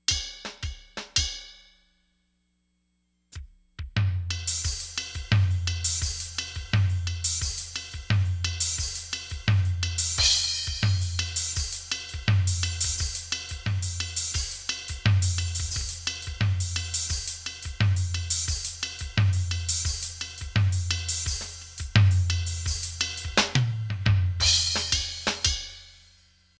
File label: slow jazz; grooves